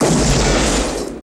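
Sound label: laser gun; Total Control - Action Cutz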